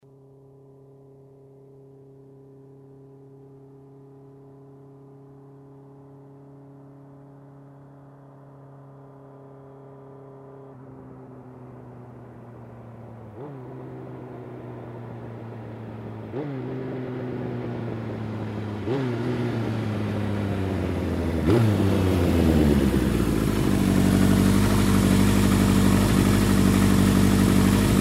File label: Motorcycle approaching, turning off